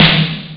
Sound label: heavy snare